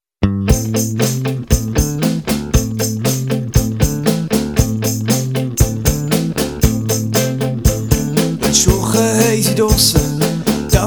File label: mundart rock